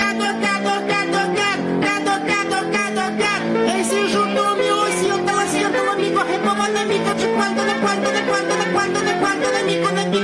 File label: guy singing phonk; audio meme